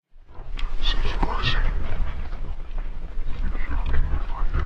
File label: Whisper; silent hill homecoming 2008 gamerip pc ps3 x360